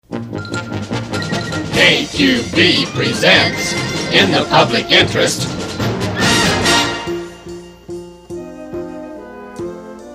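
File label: World News Promo; KQV; PAMS; can happen And when it does you will hear it instant, instant, instant, instant, instantly via (Sig)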